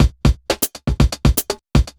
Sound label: chemical 120, loops, 120bpm